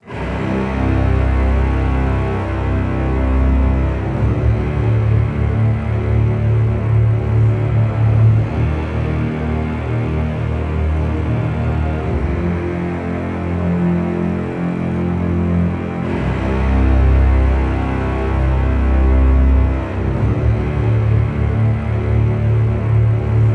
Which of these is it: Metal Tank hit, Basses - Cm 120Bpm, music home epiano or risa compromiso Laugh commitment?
Basses - Cm 120Bpm